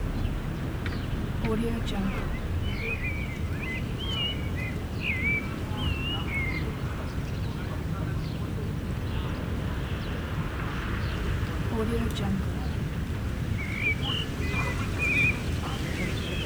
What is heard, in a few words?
seaside ambience portugal; urban sounds